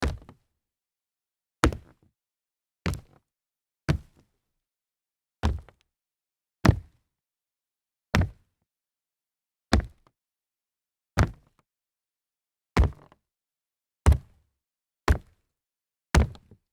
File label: sneaker solid jump hollow wood, foley